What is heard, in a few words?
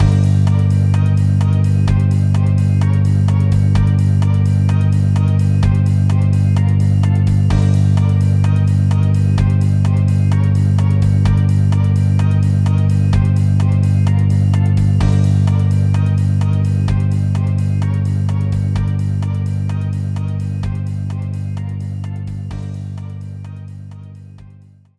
Jig; eigo de tabisuru little charo